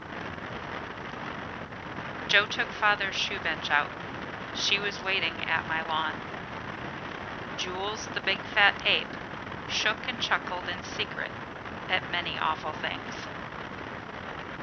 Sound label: hikers story 15mph kimono cloth and foam; mckaudio